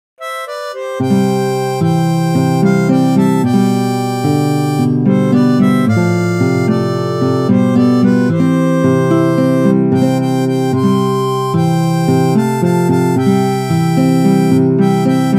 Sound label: Harmonica; Blessed Assurance